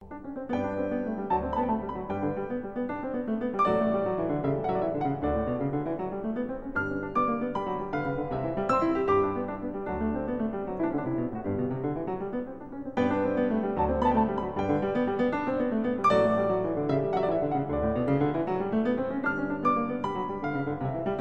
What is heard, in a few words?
Andante And Five Variations For Piano Duet In G, Variation 2; Mozart